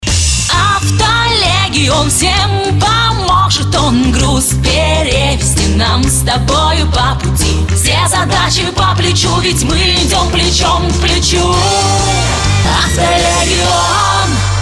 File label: voc Rus